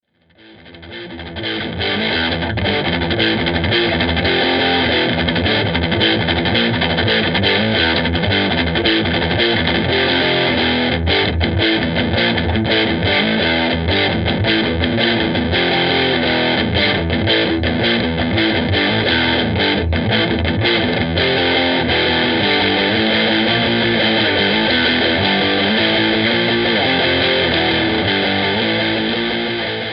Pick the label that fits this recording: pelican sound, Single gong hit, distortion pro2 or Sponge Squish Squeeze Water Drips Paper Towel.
distortion pro2